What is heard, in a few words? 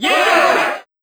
volume crowd; Freestyle Street Soccer; XBOX